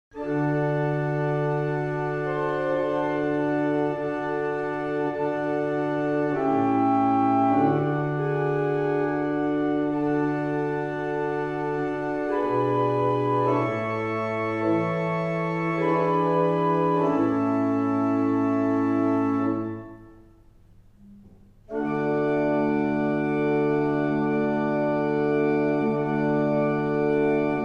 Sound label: Double chant, barrel organ; Stratfield; Mornington